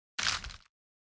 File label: bite